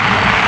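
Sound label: scrape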